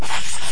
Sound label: drag; Interstate '76 NitroRiders